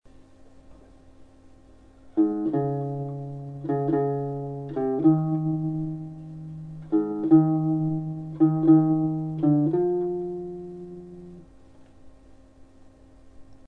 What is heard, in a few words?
wound sound, gut string